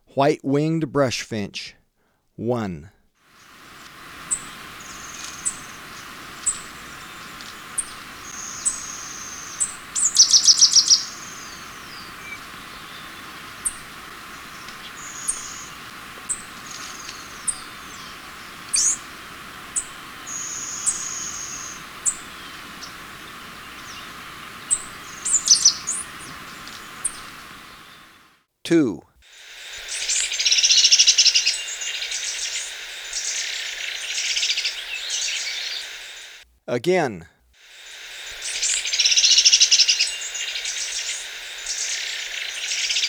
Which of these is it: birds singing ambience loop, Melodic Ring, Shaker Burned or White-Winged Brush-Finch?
White-Winged Brush-Finch